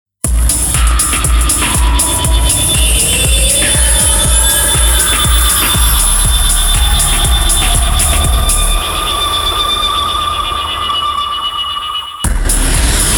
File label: Thunder in Space; Alien Soul; Shoot in Space